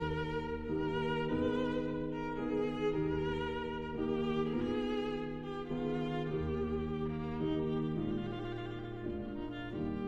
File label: Sicilienne for violin and piano